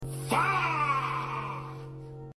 fahhhh

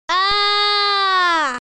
chicken angel jumpscare sound

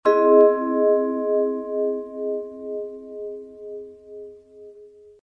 music; the loud house

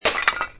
jar break

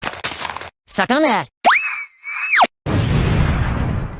cartoon throwing sound effect

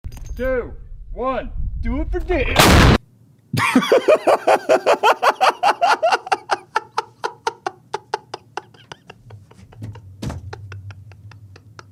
laughing hysterically; markiplier

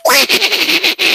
bunny laugh

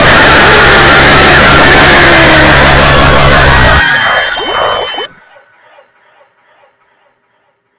jumpscare

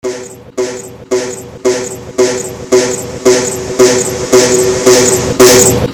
nuclear fart repeat

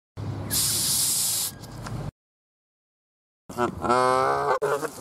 canada goose hissing

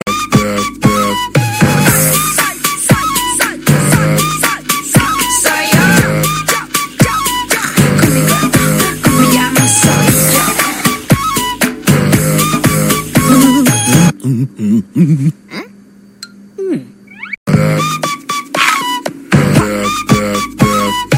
ad instrumental; grubhub

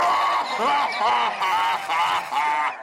aaahahahahahaha, laugh

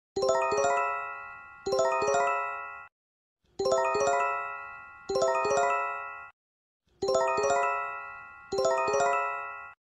earthquake network alarm sound

